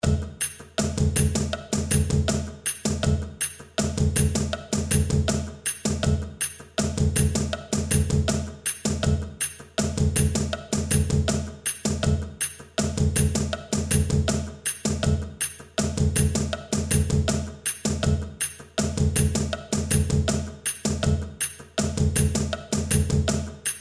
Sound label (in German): ZWEI CAJONES groove; stueck fuer 2 cajones